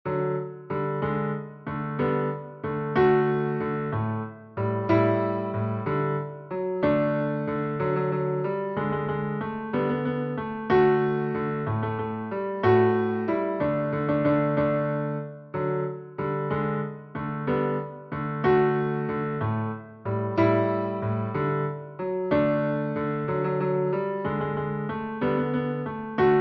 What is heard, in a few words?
waltz, guitar